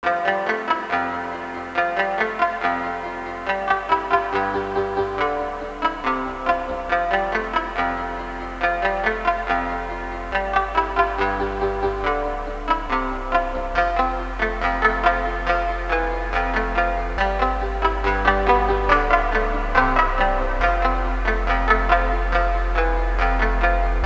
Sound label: peaceful